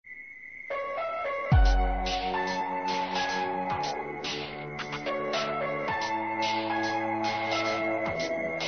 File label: sms, ringtone